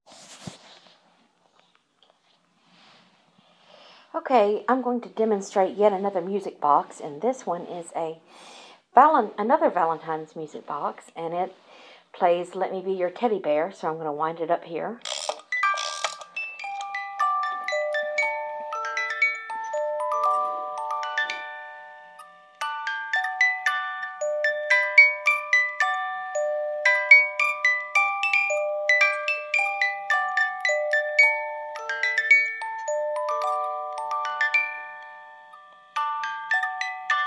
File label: another valentine s day music box